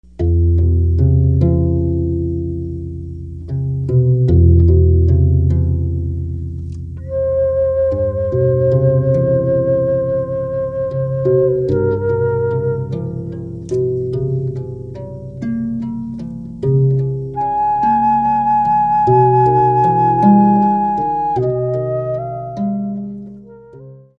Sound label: harp